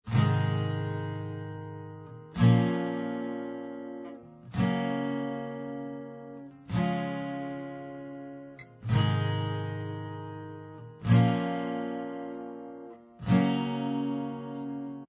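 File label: acoustic instrumental track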